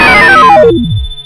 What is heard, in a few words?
deactivate; yage